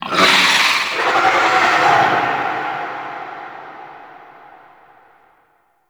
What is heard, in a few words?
Infested Juggernaut Spawn Warning Vocal; Warframe